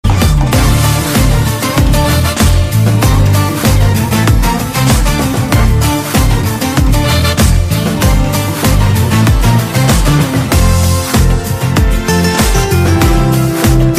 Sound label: Greek oriental